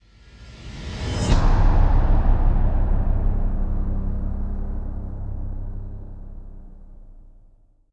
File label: Generic Stinger; mass effect 2; Lazarus